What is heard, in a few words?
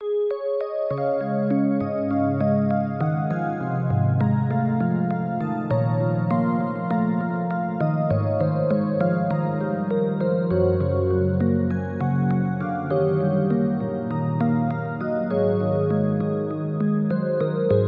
Key; Routed Sound; Spire Expansion - Story Walker Vol.1